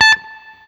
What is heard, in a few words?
BLU POP, Guitar & Bass